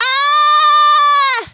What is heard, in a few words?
waaaooow; Toad; Peach; sm64coopdx